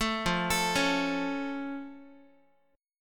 F Augmented Guitar, chord; F+ arpeggiated; 12,13,12,11,x,x; 4A,4E,5A,5D,5G#,6C#; chord shape

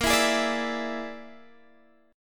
A sharp 7th Flat 5th A sharp, Guitar Standard; A#7b5 strummed; JGuitar

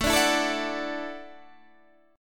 E 9th Suspended 4th A, guitar

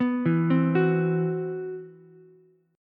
C Major Sharp 11th, Ukulele C6; CM#11 arpeggiated; JGuitar

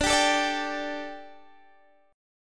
D Suspended 4th, Guitar, Standard; Dsus4; strummed; 22,22,19,19,20,x; down; capo=9

